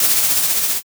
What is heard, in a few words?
balloon inflate